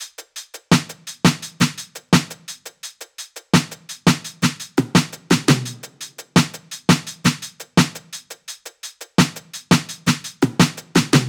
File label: top loop 85; Pop; Drums; Loops; Slate; SD PASADENA Pop Sample Pack